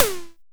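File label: MKS TOM HI, analog toms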